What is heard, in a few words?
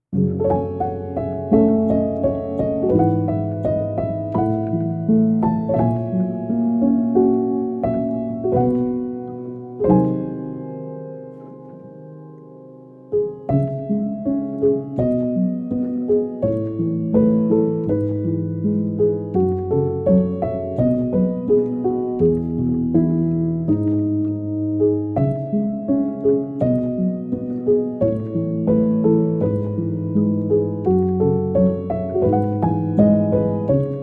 sleep piano